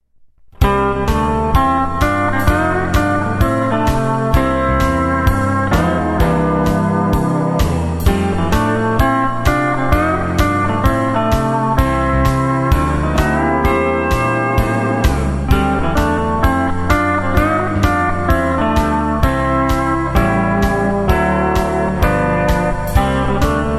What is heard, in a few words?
Forty String Swing, steel guitar